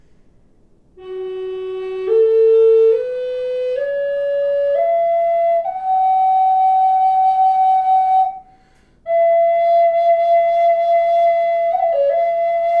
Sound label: natural, wood flute